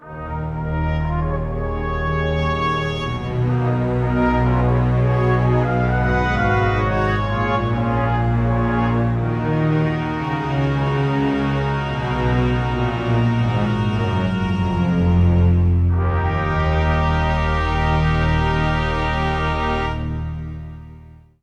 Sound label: Gameover; Kuusen; Birds of Prey; PS2